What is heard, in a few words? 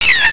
BIRD